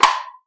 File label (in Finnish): kansi, Clangy Crunchy Foundsound Kit